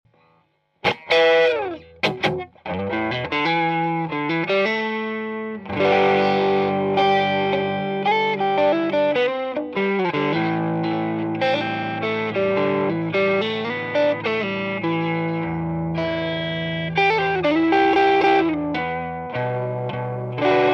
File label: strato lt